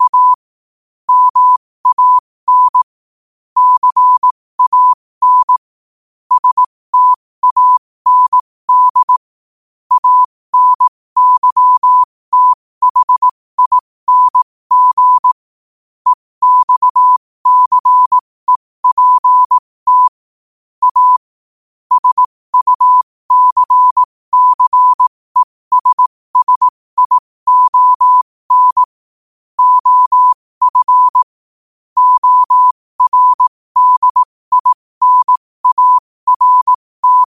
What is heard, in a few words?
5 wpm, morse